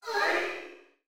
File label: crystal enemy; battle